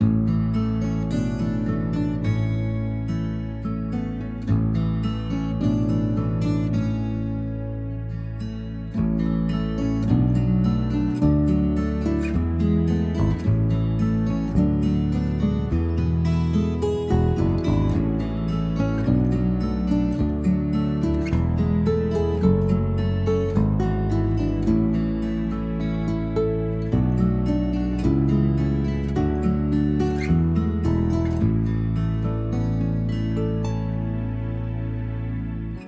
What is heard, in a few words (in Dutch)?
Dierengeluidenspel achtergrondmuziekje; Juf Roos